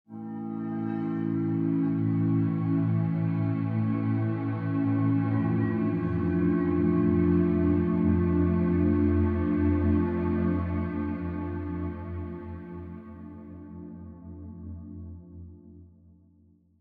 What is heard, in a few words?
Explore Day Pad chords; Horizon Zero Dawn